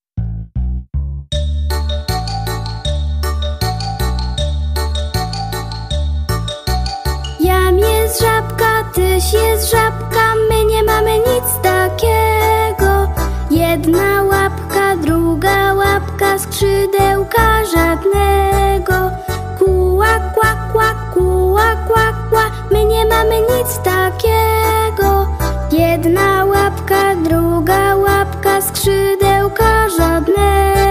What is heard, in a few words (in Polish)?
Karaoke dla dzieci Żabki z wokalem